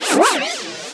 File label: Randomize Button